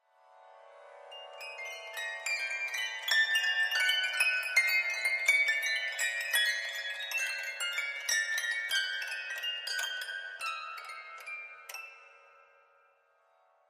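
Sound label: Grand Piano Strings High; keyboards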